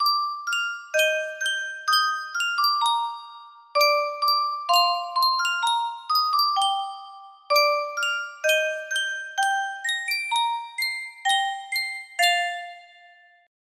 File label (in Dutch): yunsheng muziekdoosje, music box melody